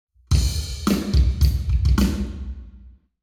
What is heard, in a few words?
Hall, Drums 0deg; eMagLS